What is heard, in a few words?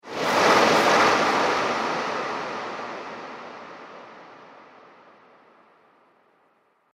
Ghosts Haunting Slimers; Ghost Sound Effects; horror sound effects